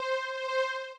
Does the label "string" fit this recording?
Yes